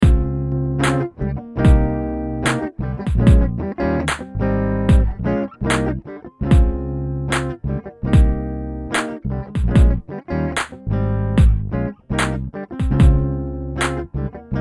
little Groove loop

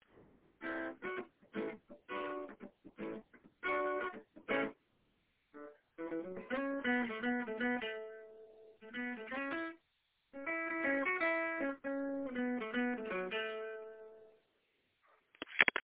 happy skipping jumping melody

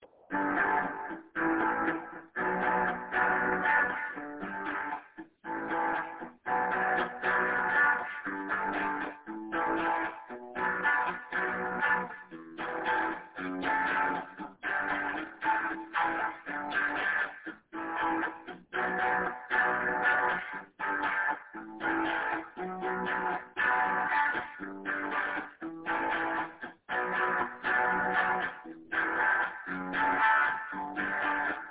chords

